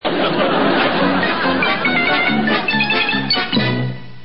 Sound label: music